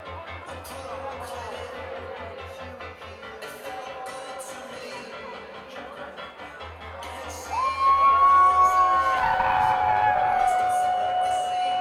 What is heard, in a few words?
crowd, Live, Bottom of the Hill, San Francisco